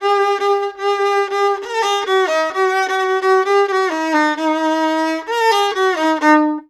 VIOLON, ArabianTraditions

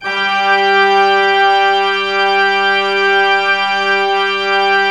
Orgue Eglise Full G4, Pipe Organ Full

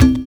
LA CLOCHE; Univers Sons

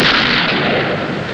357 TIR, REVOLVER; East West - Global Sounds

